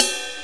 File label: Short Ride, Cymbals; Sonic Foundry (Sony Creative Software); Drum Tools